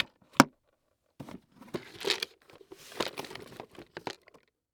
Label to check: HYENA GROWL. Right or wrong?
Wrong